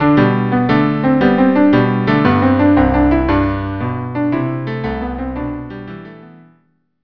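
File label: Gigue violin cello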